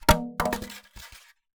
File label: Polystyrene Rattle